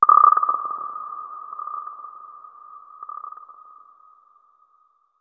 Forest Amb

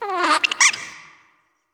Monkey

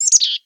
Random Chickadee

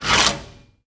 Giant Switch Up; moul

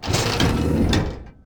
Door Open Close; Bevin

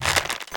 Turn

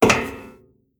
Metal Walk; moul-assets

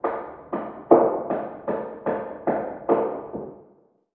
Random Double Hammers

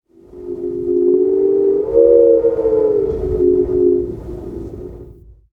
Whistle; moul

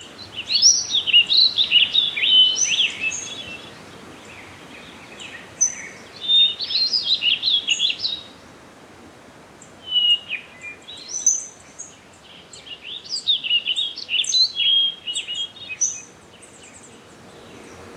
Amb Loop; Eder Delin

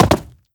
Stone Jump; moul-assets

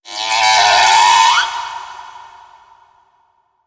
Critter Female Verb; Kahlo

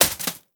Bskt Stn Hit

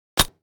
Harvester Call Bttn Out

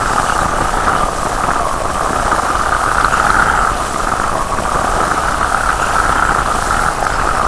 Fumerol Loop

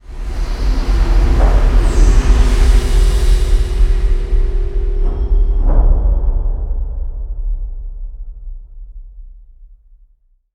Silo Amb Stop

